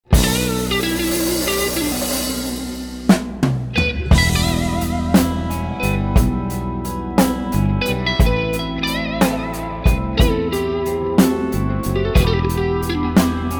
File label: drums